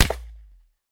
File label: pp19 fire, weapons